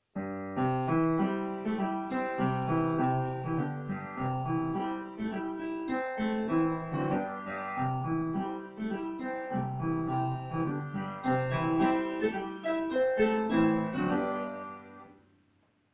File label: Third Symphony second mvt for piano; Schumann